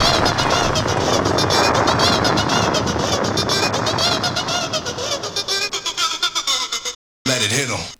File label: Vox, Trance